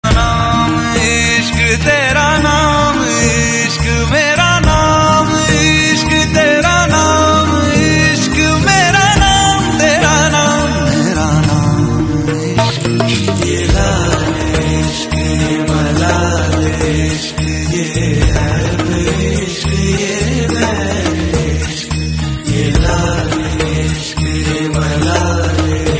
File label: hindi ringtone